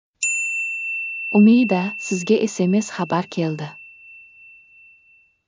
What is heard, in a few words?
sms; gudok